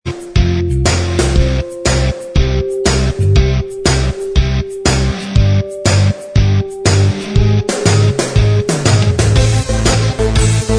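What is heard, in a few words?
instrumental, backing tracks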